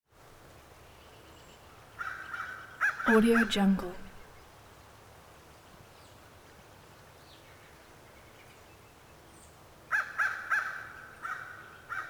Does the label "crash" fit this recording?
No